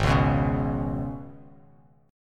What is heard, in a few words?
G sharp Minor Double Flat 5th G sharp, Bass Standard; G#mbb5; strummed; JGuitar